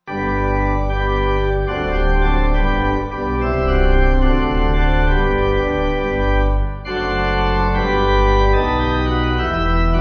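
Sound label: Pipe organ, church music backing; God Has Blotted Them Out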